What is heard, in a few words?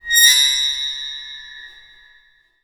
Cymbal; Percussion Samples